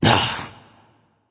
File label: "ugh"; smite; voicepack; sylvanus